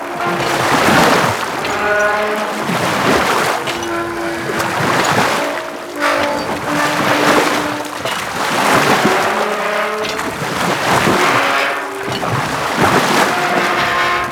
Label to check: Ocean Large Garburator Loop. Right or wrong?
Right